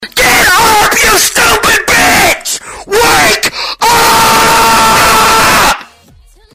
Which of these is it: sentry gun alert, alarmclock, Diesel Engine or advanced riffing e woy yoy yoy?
alarmclock